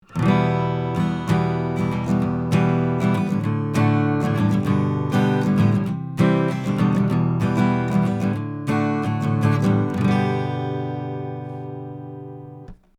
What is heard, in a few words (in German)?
Melodium 42B Echoschall Acoustic Git 50cm